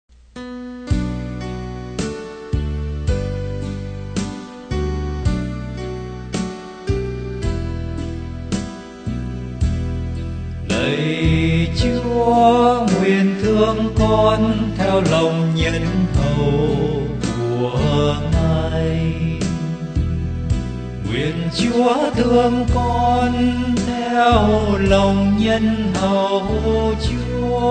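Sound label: soprano; Đáp Ca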